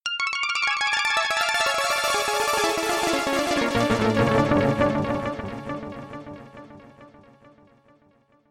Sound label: arpeggios arpeggio; zasfx